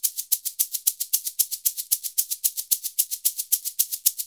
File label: SHK, Shaker; Univers Sons; Basicussions